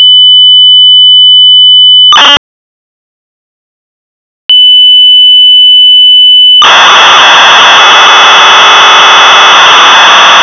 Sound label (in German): ZUG